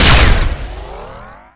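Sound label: railgun fire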